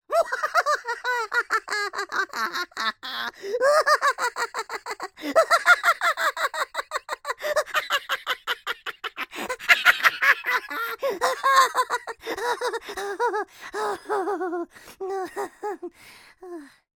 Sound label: cartoon high pitched laughter hysterical funny male